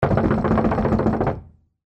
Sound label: door knock fast